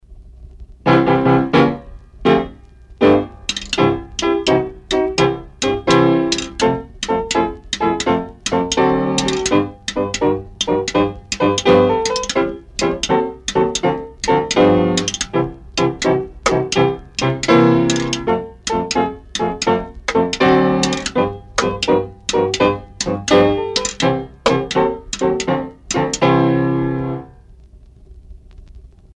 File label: gallops